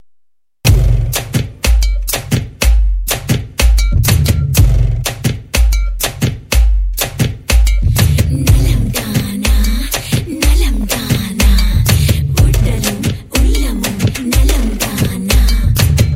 sad sms tone

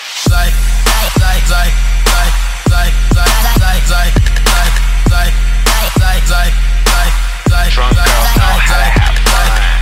Trap Tune

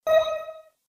Private Message

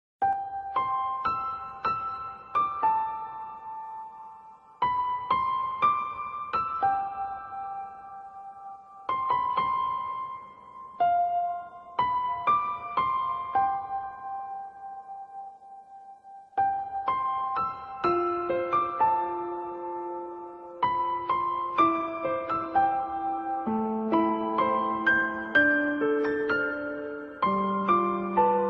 korean piano; classical

